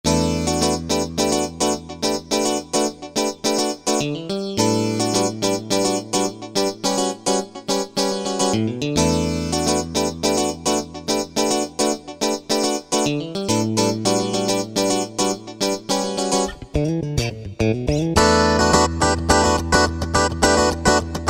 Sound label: Clean Guitar Demo, Yamaha PSR-3000; Factory Demos; MEGA VOICE Technology